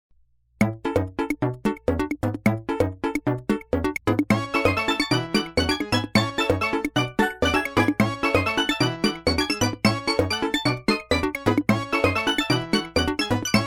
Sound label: Beach Overworld, beach music; New Super Mario Bros.